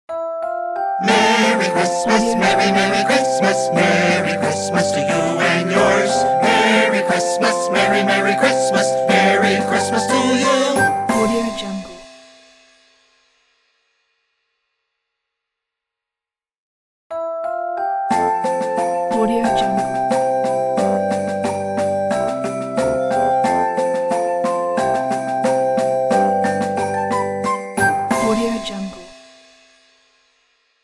cartoon merry christmas to you ident

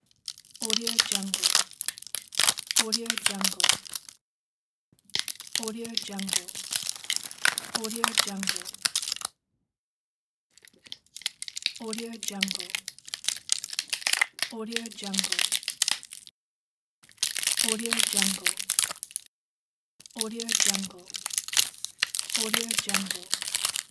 ice cracking; tsymbal

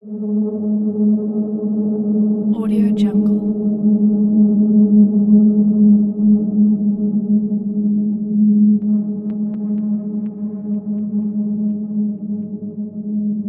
atmospheric

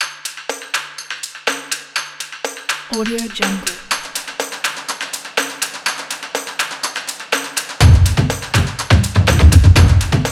wild percussion; pressmaster

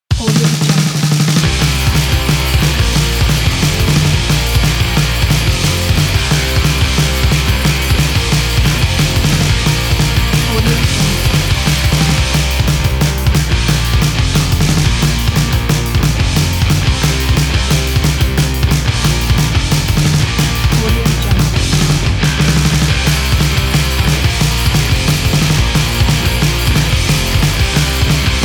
street punk

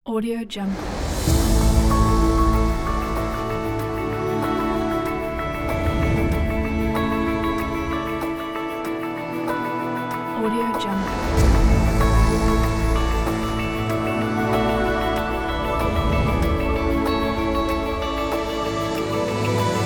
awards

